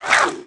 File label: Mutant Stab Attack; Valleakan Mutant 1; Aliens